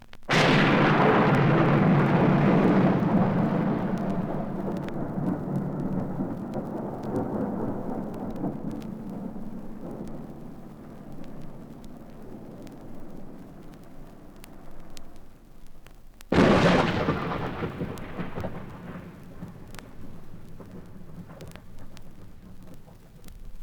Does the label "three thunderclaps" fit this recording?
Yes